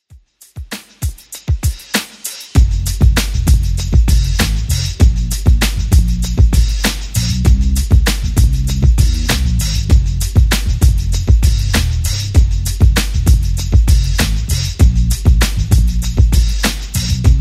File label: 90s Old School DJ Intro Outro Redrum 98bpm